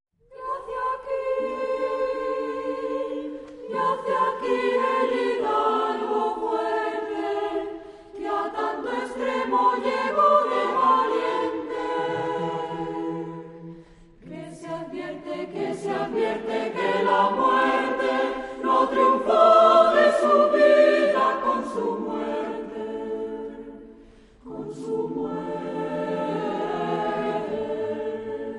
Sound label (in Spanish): coro; coro ateneo de pozuelo; 2a Bienal Coro Mixto Pena Santa